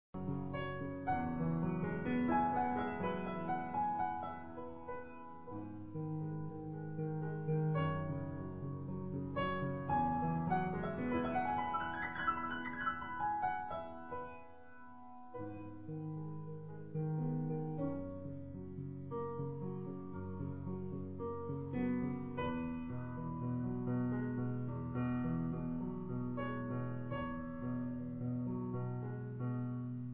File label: piano